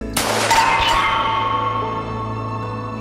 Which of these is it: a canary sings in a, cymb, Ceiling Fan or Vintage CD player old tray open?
cymb